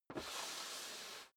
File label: step high heels metal dry slide long; schritte